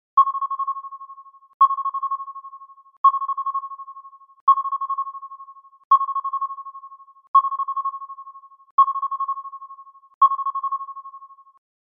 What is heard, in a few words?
Sonar Pings